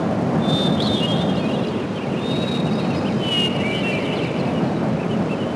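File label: loch bird; Splashdown; XBOX; Soultree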